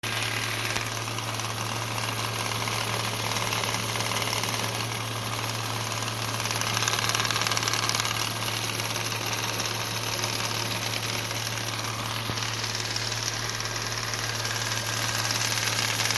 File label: engine rattle, volvo xc70; help what engine rattle